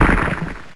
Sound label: Splintered Stormshards; pokemon reborn e19 2022